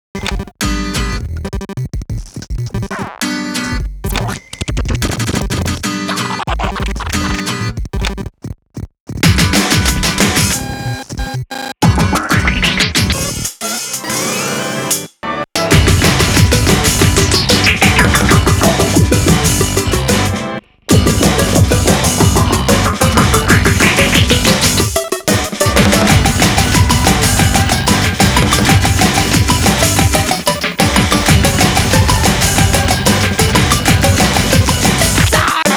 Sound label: instrumental